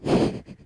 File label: Unvoiced snort like female